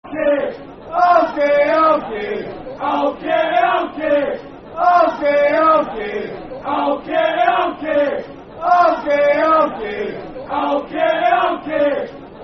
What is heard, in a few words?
Alchy, Alchy [Football Chant (Soccer Song)], Partick Thistle; Ptfc